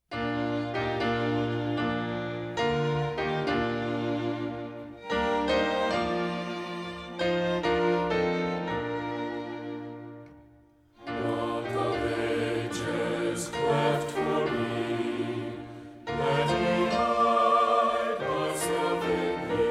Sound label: words and music; rock of ages